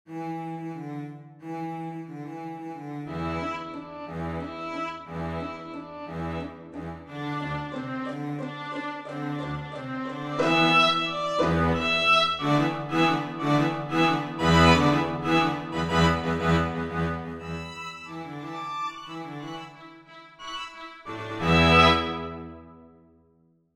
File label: String Quartet